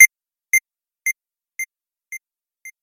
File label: Square Wave Delay